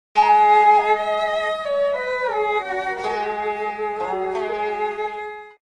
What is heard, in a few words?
mission passed; gta chinatown wars